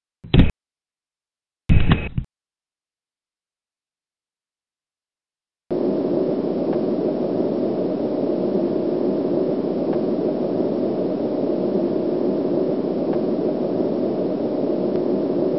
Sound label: door back